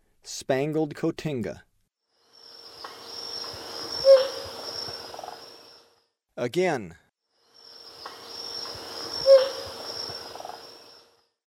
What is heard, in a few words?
Spangled Cotinga; S. America; Eastern Lowlands